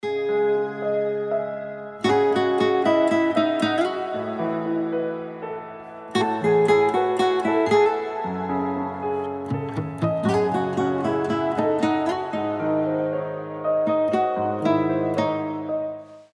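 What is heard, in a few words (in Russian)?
nezhnaia melodiia romantik, gentle melody romantic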